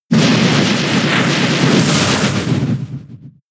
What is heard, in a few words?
Wood Hammer; pokemon sfx gen 7 attack moves sumo usum